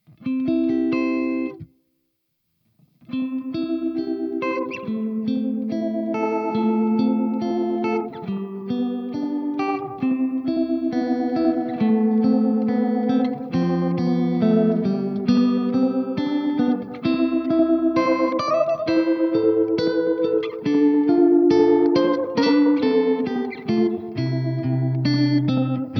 Vintage Oil Can Echo, Max Rate and Depth values and a minimum Short Time value create lush modulation.; Dry and Wet mixed in Pro Tools; Underwater Bicycle; Olivera